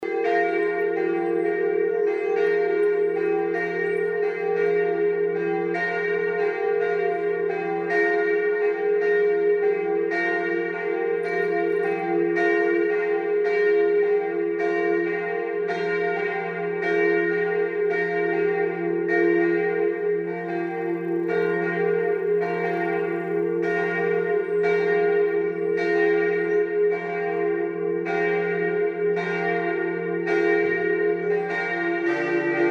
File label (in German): Klingelton; St.Antonius